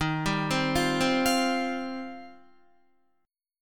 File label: Eb 6th Add 9th, Guitar; Eb6add9 arpeggiated; 11,10,10,10,12,12; JGuitar